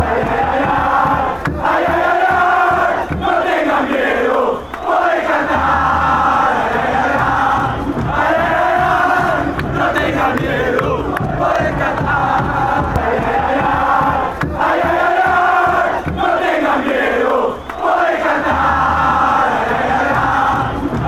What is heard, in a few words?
ay ay ay dont be scared, chant